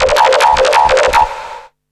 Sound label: SONAR, Hard & Loud Techno; USB Soundscan vol.01; AKAI